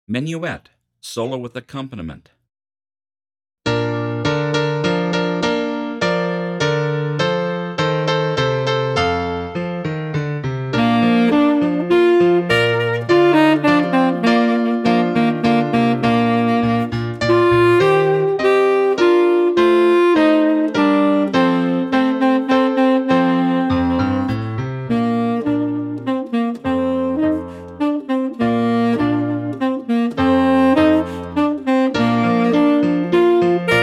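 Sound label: Menuett Tenor Full, Tenor Sax; 10pct Slower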